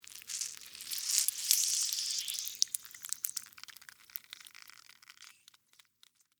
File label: Squeeze Orange, Long, Fruit; Texture; Squishes (Sound Effects:Objects); BlastWave